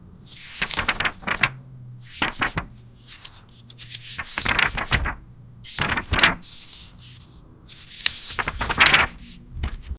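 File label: pages turning chalk on board, classroom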